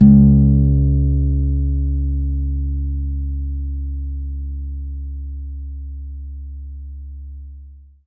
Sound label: acoustic bass c#2; PS-52 WORLD INSTR 2; STRINGED INSTRUMENTS; PS VESTER ACOUSTIC BASS